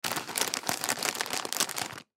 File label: Potato Chips Bag, Potato Chips Bag Rustle Movement; Food; Household; Foley; Blastwave